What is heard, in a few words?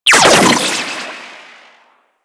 Shock Rifle Primary Shot, weapons; Warframe; Corpus